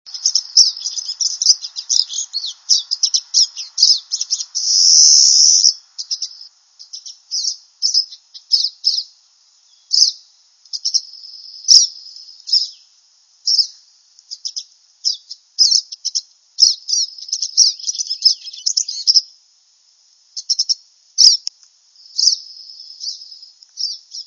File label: siskin pine with zreeee; birdsongs